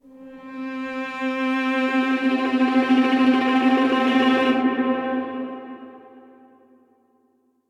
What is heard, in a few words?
MPE Spectral (Slide & Pressure) - String Quartet; Instruments; Ableton; Factory Packs; String Quartet by Spitfire Audio